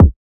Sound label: Kick (E); Drum One Shots; Boxcutter